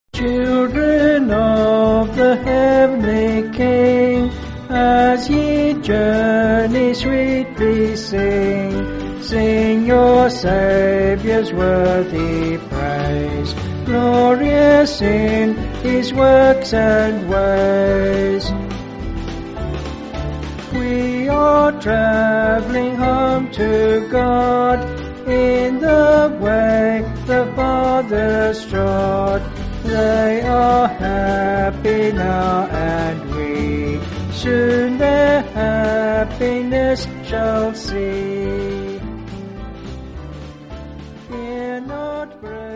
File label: Pleyels Hymn